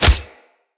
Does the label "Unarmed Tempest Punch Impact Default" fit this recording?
Yes